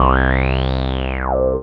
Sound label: BAS, Eurotech; Sounds Good